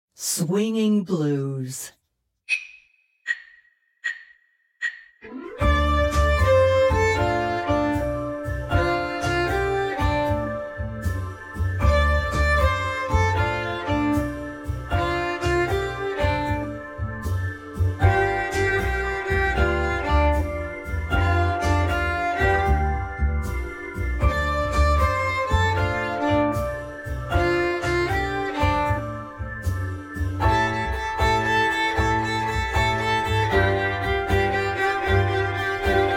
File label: Swinging Blues